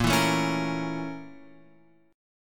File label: Bb Minor Major 7th Flat 5th, Guitar, Open F; BbmM7b5; strummed; JGuitar